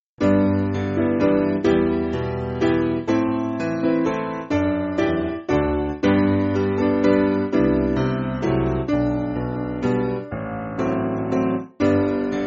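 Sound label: Piano, Music Backing Files for Churches; Spirit Of God Unseen; Boat Song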